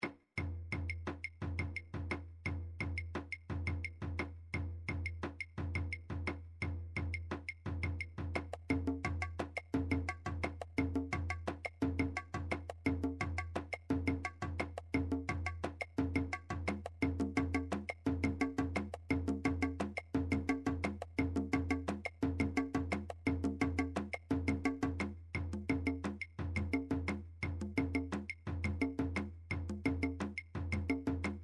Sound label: drums